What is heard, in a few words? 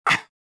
jump; reborn